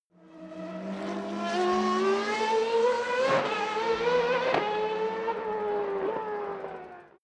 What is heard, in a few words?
racing car f1 drive by shift up